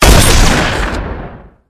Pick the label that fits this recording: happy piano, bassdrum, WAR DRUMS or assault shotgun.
assault shotgun